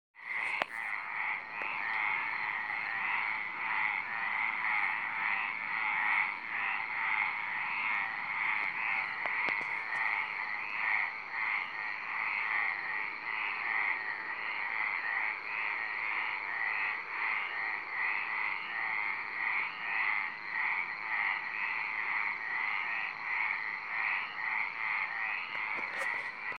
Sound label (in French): Hyla meridionalis et Hyla arborea; Gaëlle-Caublot